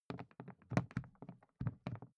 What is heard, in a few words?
briefcase plastic handle handling; industrie wirtschaft; bueroarbeit